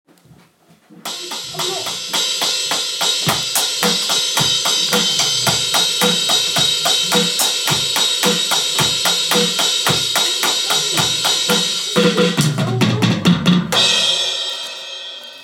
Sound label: 4/4 drum